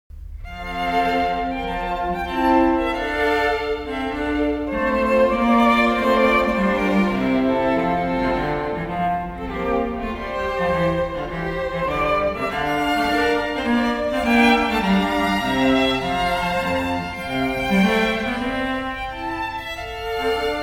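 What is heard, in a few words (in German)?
Ouverture, streichquartett; Haendel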